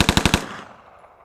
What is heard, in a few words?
Machine Gun-Fire; 1944 Battle Of The Bulge